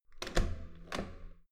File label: metal door heavy handle use